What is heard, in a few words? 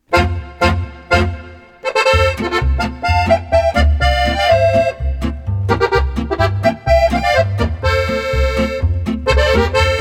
Polka Band Polka; Philadelphia